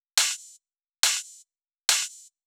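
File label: drum Loop 140BPM overhead; Vengeance - Essential Clubsounds Vol.5; VEC5 Drumloops; VEC5 Multi Loops 140BPM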